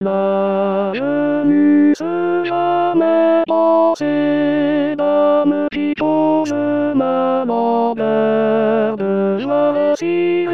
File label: tenor